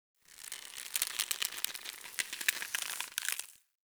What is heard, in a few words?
Tendon Stretch Medium Close; Ambience; Warframe; Infested Eris